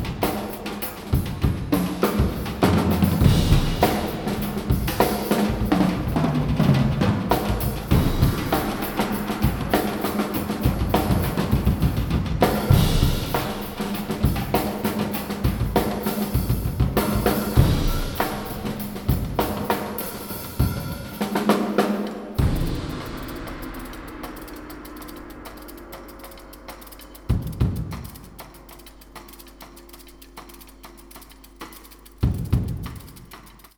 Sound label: Percussions Binaural